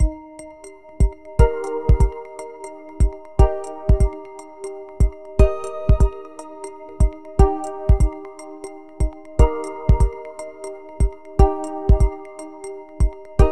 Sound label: a gentle down tempo groov, plucks